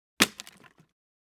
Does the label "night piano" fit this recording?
No